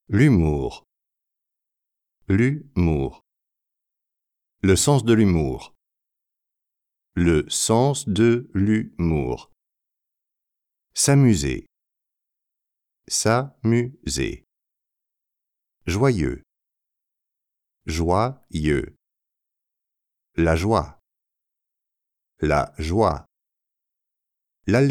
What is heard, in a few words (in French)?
Humour rires